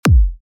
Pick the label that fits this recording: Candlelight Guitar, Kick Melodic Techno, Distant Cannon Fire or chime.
Kick Melodic Techno